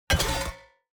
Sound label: environment battle; Shelter